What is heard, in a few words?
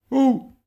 hit; HyperRogue